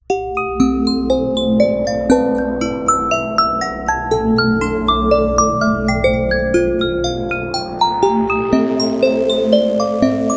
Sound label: horror lullaby; zagi2